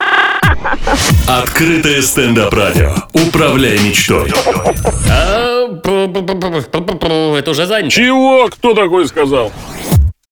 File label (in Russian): jingle, радио; СТЕНДАП РАДИО